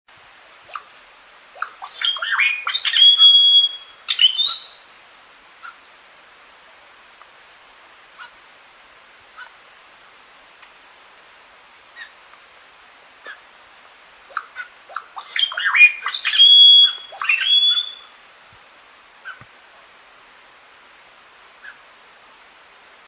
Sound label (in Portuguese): Canto do cacique candela